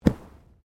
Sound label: Body movement Ground Impact Light; Body movement foley to simulate falls and deaths; Wrestling And Struggling Sound Effects